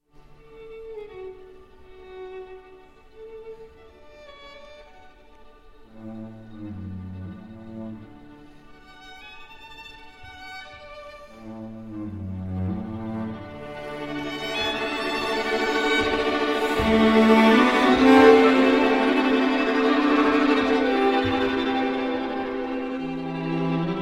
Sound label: string quartet in g major; Doric String Quartet; Franz Schubert; D887